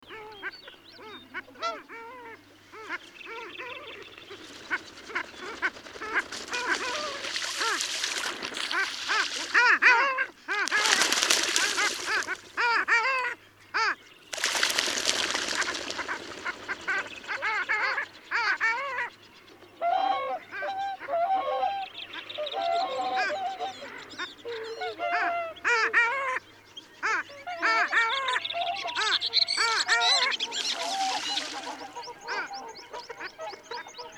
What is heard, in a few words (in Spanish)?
pato havelda